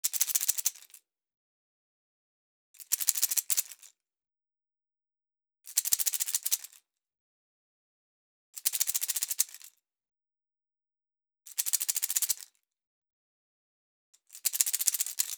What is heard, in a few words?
Rawhide rattles; Crow Hop (Weemmarategwa)